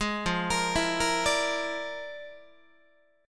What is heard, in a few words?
F Minor Major 13th, Guitar; arpeggiated; JGuitar